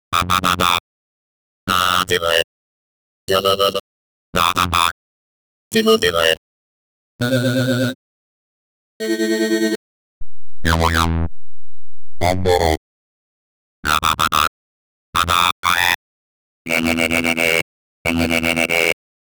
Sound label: retro 90s computer startu